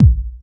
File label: short bass drum one shot C key